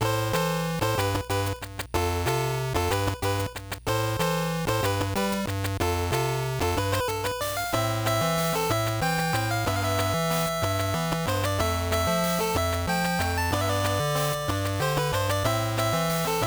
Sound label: Love Accordion; pop'n music best hits